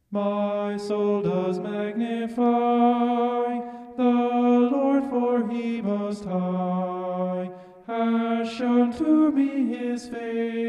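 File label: vocal homophonic; song of mary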